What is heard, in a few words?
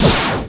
ROCK